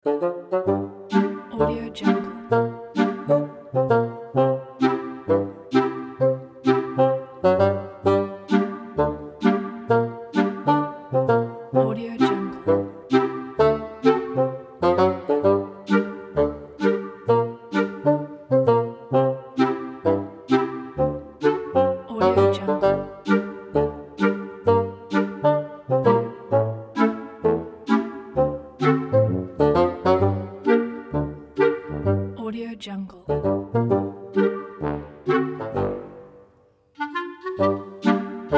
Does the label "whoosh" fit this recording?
No